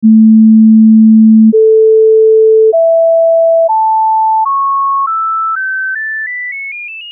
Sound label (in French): sinus